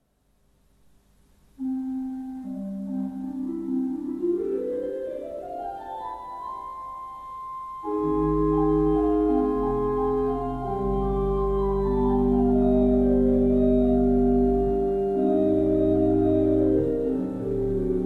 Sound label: Gedackt